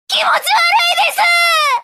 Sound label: stabbed; freak fortress 2; konosuba